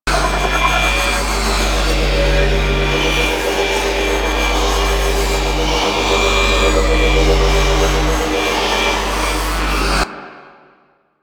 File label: Fast Travel Station Loop; Warframe; Open World Fast Travel